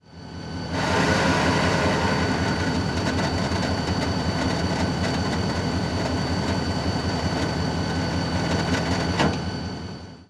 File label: Brake SafeSet Release; RailPro